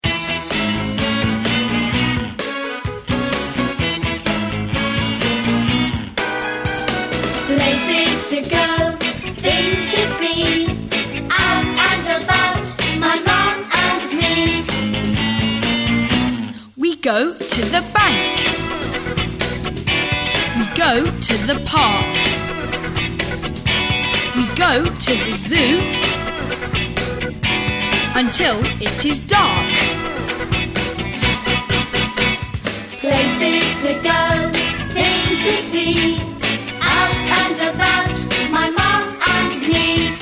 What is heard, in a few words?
karaoke